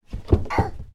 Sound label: human toddler falls over